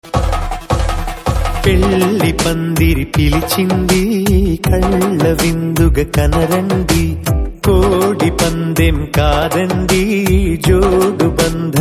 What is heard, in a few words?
Telugu Ringtone